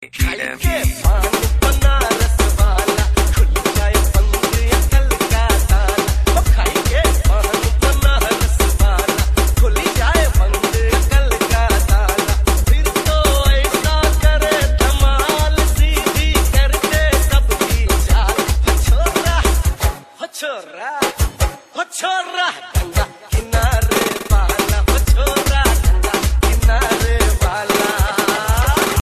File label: punjabi remix ringtone